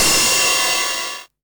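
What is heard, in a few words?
909 ride tape saturation; Goldbaby Tape808 & Tape909; The Tape 909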